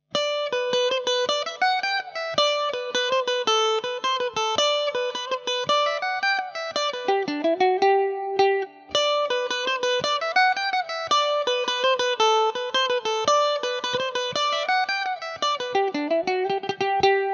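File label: G major mandobird, irish mandolin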